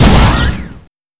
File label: main shot